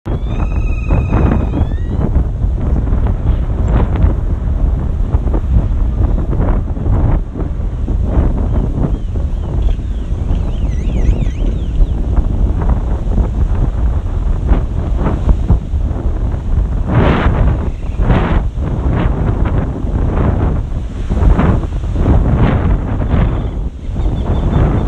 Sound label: texas birds